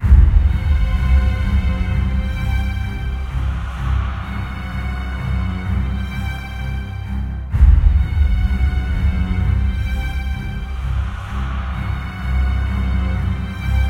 Swamp; magicka wizards of the square; android

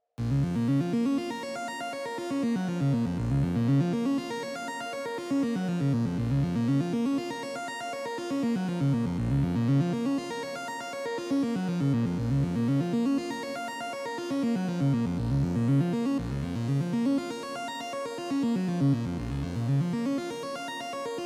Red Fog Analog Soft; Get Even

